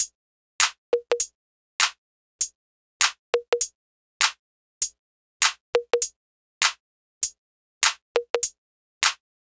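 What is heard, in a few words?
percussion; Just Add Drums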